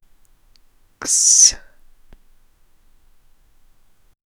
make one long and sloppy, mouth

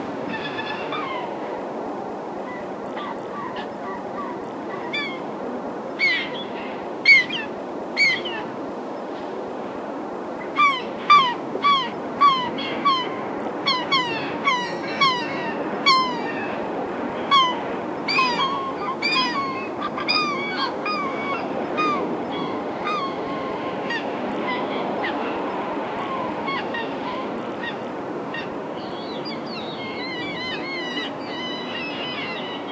distant seagull calls wit, birds

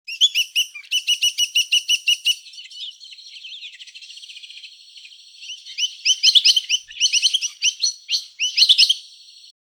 a lively tropical parrot

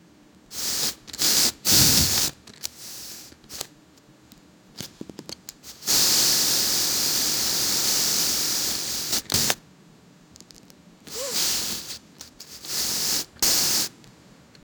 airbag deflation sounds

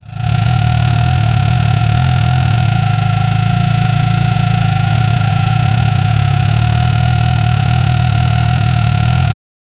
high pitched buzzy kart

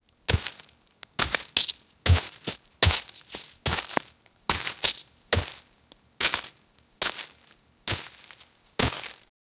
footsteps in ash or debri, crunching

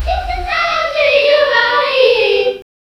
noisy but melodic hey, choir

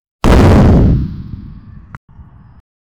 sound effect of, football

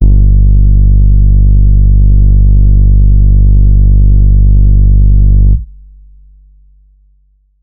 generate endless 808 bass

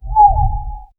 a meow from a far

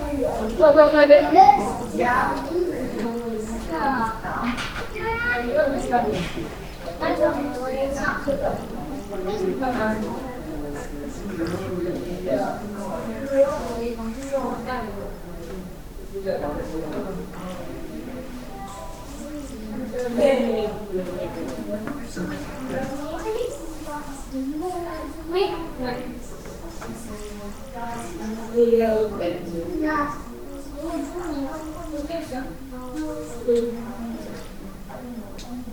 a background of a low, school

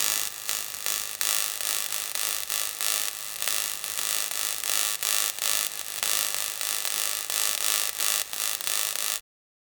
electric spark on an elec; electricity

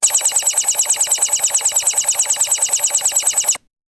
laser pistol slight charg, charge up

